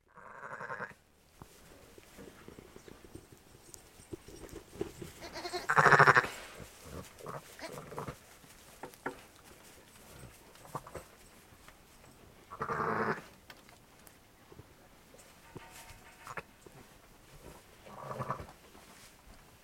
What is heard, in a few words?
Ewe lamb contact notes